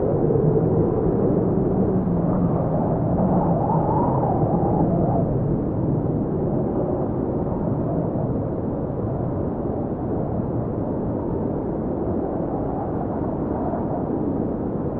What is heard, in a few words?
haunted house wind; Horror